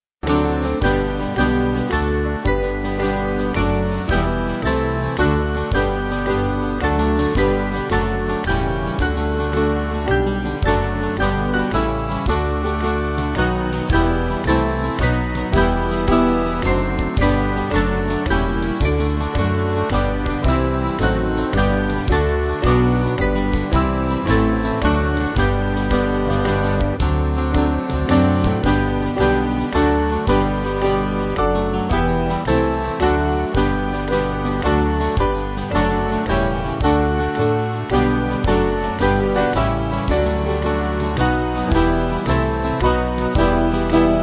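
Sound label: Band, Music Backing Files for Churches; Ye Servants Of God; Hanover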